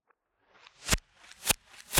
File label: shooter time reverse gun